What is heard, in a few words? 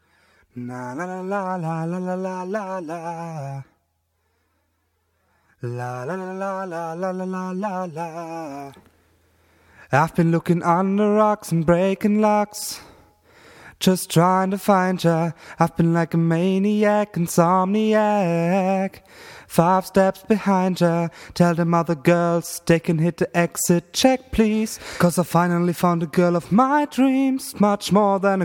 short acoustic cover